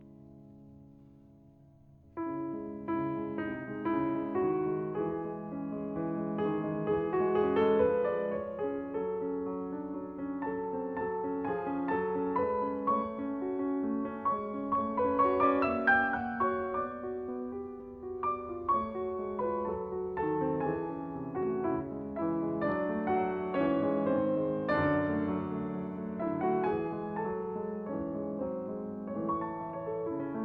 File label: Piano Sonata in B flat major